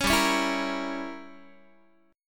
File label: G Diminished 7th, Guitar; Gdim7 strummed